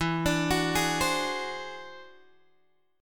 E Augmented 9th E down Guitar Open G

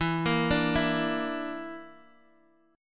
A sharp Major Flat 5th, E

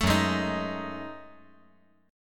F sharp Minor 6th Add 9th; strummed; JGuitar

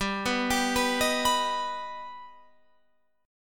G Augmented G Guitar Open F; G+ arpeggiated; 19,18,19,18,18,18; JGuitar

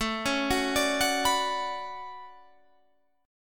D Major 13th A, chord

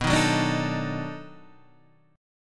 B 7th Sharp 9th, down, Guitar, Standard; B7#9 strummed; JGuitar

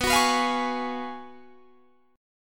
F sharp Major 7th Suspended 2nd Suspended 4th, B

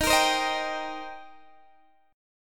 Eb 6th Eb down Guitar DADGAD

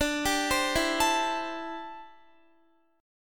D 7th Suspended 2nd Suspended 4th D, Guitar; D7sus2sus4; arpeggiated; x,17,17,17,16,16

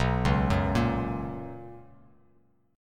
B Major 7th Suspended 2nd Bass Standard, chord; BM7sus2; arpeggiated; JGuitar

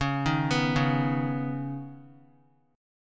Db 7th Suspended 2nd Guitar, Db7sus2 chord; JGuitar

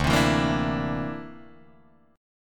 D 7th Suspended 2nd Sharp 5th, Guitar Drop D

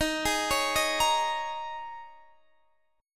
D sharp 7th Suspended 4th, Guitar, Open D

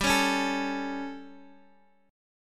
G Suspended 2nd Flat 5th G, Guitar, Open D

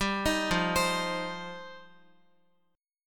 F 7th Suspended 2nd F down Guitar; F7sus2; arpeggiated

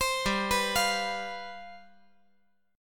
G 11th G, chord

